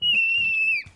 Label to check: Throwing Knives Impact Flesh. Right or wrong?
Wrong